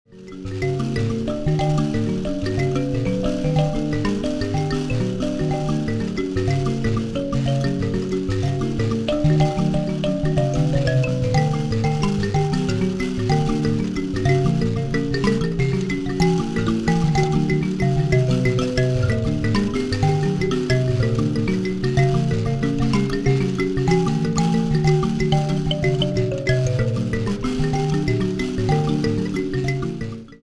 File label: mbira